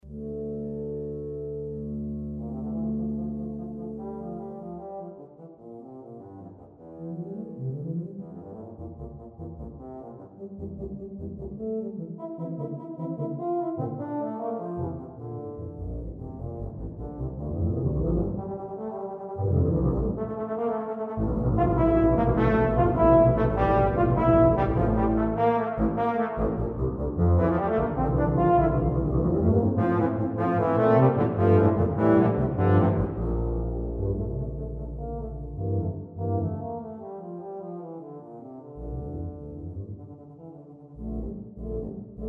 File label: tuba suite